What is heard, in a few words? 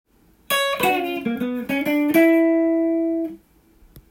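B minor melody, guitar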